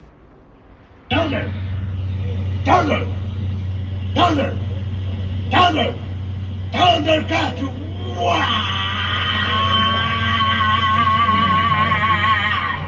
thunder thunder

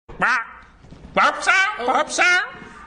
quack

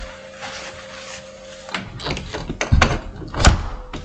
door close

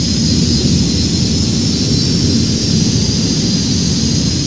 clone arcfighter engine; lego star wars

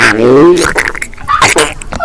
man scream

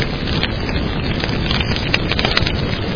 fire

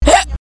hiccups

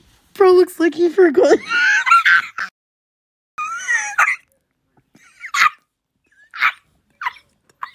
barbie screaming

